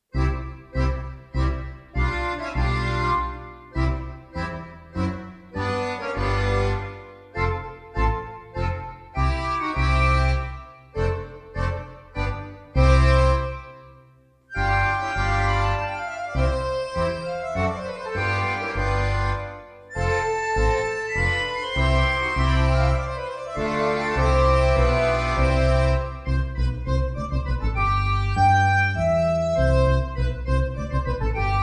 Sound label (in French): Accordeons; ouverture Italienne